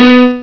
piano

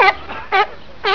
SEALION, ANIMALS

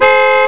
HORN

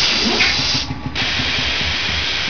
steam machine; MACHINES

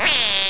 quack

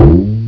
BOING LO; EFFECTS

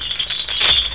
jingle

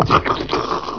slurp

